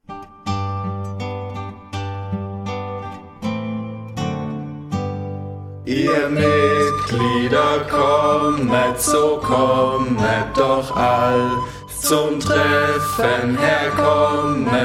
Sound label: jingle; mitglieder versammlung